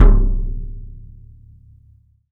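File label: RESONATING KICK; 3D Drums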